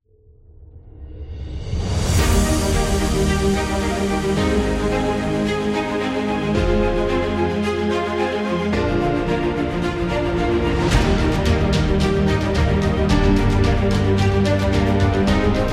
Awards Opening; documentary

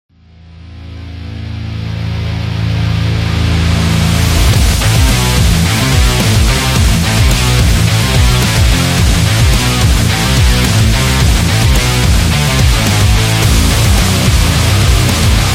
A Metal

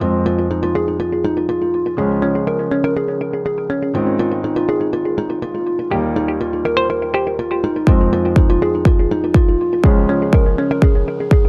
Upbeat

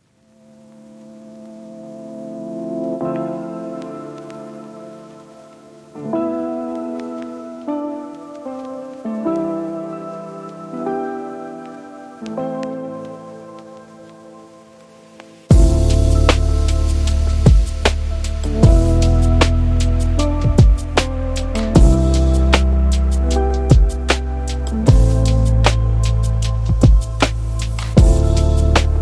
Lofi Piano

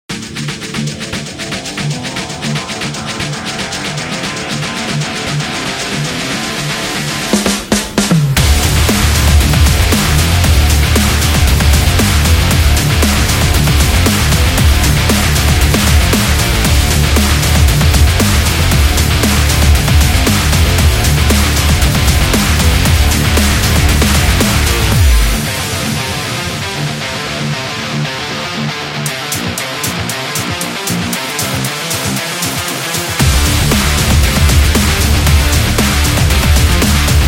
Sport Rock Action; sports training energy

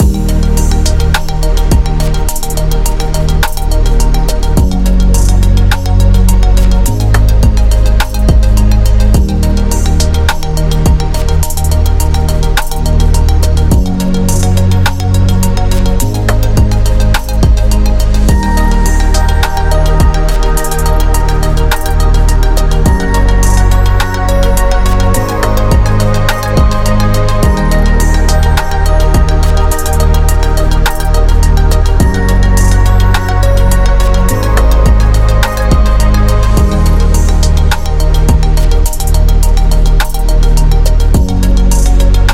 Sci-Fi Loop; hi tech

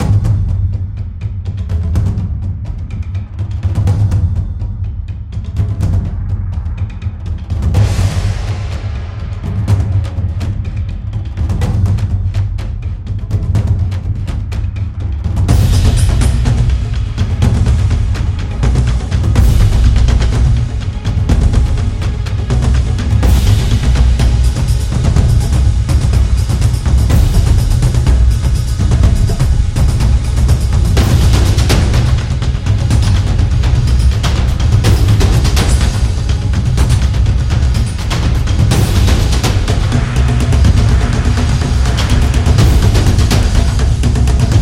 Taiko Drums Traditional

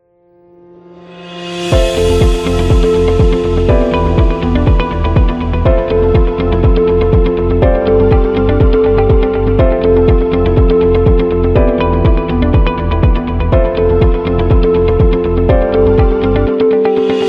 Real Estate Luxury

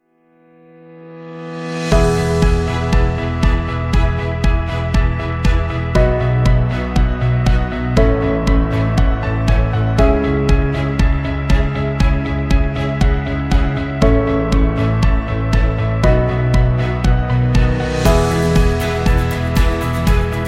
Positive Business Background

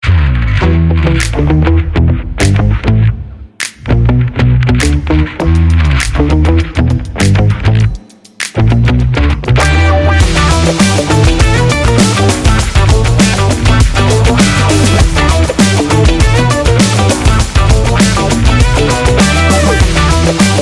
Upbeat Funk; transformation

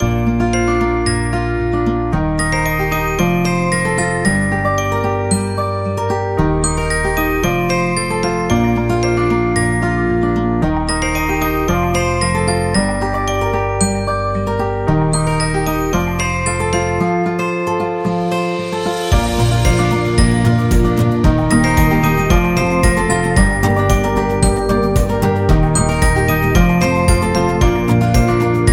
Happy Food

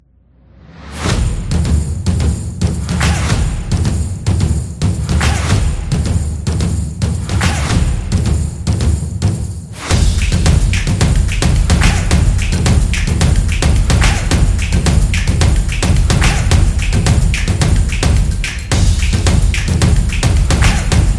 Karting, sport drums

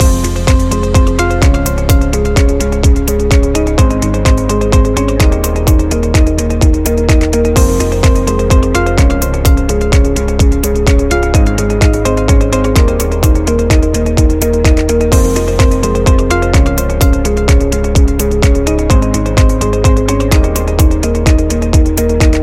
Corporate Loop Upbeat

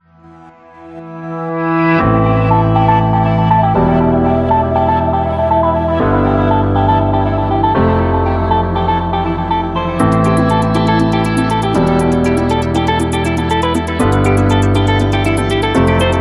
Corporate Office

